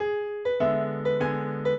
piano; Mozart; minuet

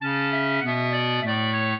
clarinet; Mozart

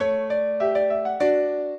minuet, piano; Mozart

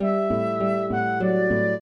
trio, flute harp; Mozart

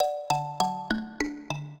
trio, mbira; Mozart

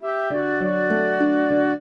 trio, flute harp; Mozart

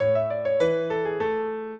minuet, piano; Mozart

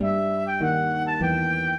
minuet, flute harp; Mozart

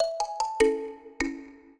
minuet, mbira; Mozart

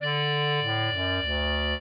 clarinet; Mozart

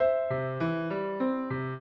piano; Mozart

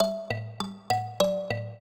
trio, mbira; Mozart

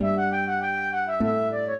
trio, flute harp; Mozart

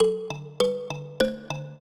trio, mbira; Mozart

